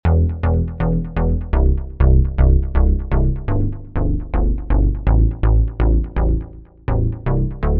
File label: Bass; PatchFactory